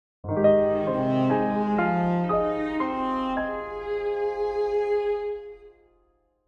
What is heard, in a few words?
Video game success victory win romantic